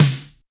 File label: snare single hit c sharp key, Royality free snare sound tuned to the C# note. Loudest frequency: 2725Hz; snare drum samples